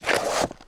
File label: draw shield; Mount&Blade Warband